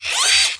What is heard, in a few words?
zoom; Quake 2